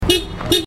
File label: Mazda MX5